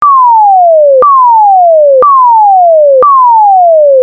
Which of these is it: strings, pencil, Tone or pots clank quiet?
Tone